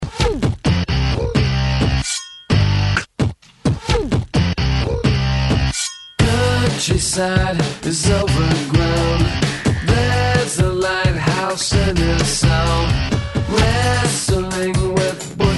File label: Rock Quick Hitter 130 Bpm; DJDOLLS